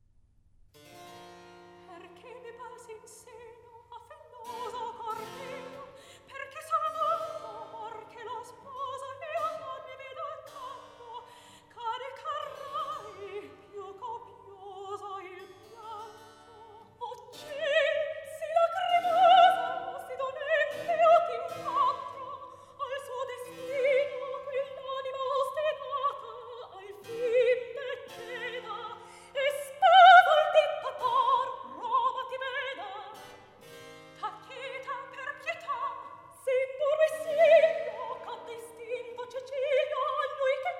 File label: Recitativo; Perche mi balzi in seno; Mozart; Lucio Silla